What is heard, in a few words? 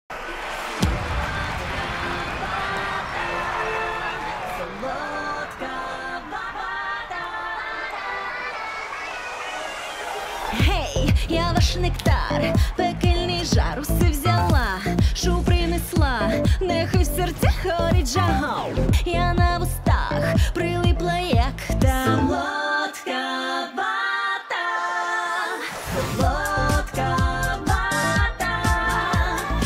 Ukrainian cover; пекельний бос; helluva boss